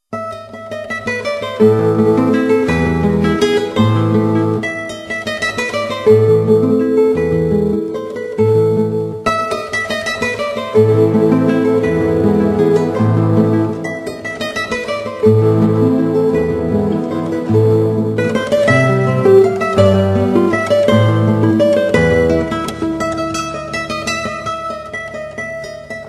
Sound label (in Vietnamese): ghita khong loi